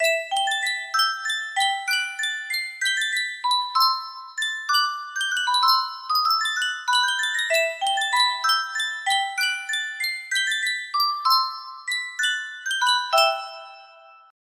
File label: music box, music box melody; sankyo; final fantasy xv